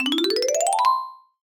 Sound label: comedy marimba ascend; sound effects one